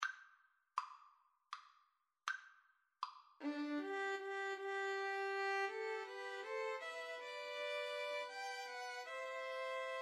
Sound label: Shenandoah, 2 violins viola